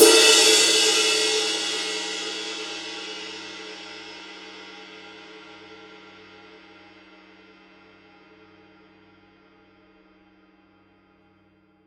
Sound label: 20 power ride edge, paiste 2002